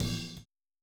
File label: Boogaloo - Outro - Tom Rack, 82 BPM; Sonic Foundry; Drums from the Big Room The Mixes